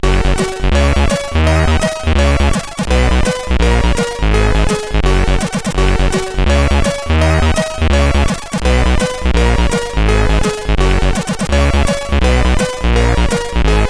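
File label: Xmas Rock, MOS8580R5 sid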